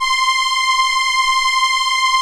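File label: ARP STR ENSL violin C4, Vintage Keyboards; Big Fish Audio - First Call Vintage Keyboards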